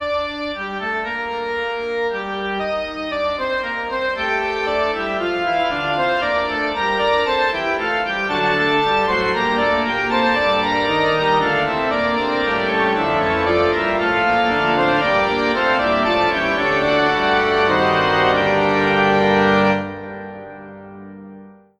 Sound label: fugue